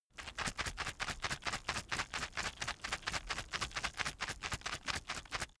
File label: plastic drug blister shake soft